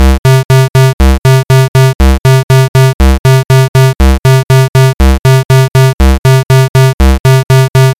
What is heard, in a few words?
beats; procjam